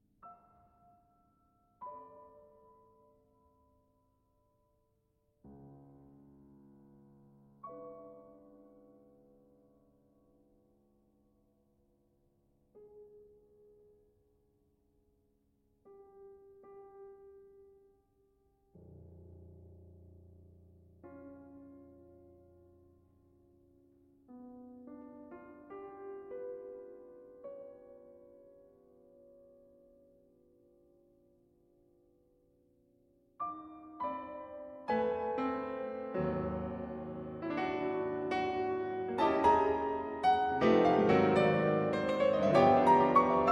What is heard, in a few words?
Piano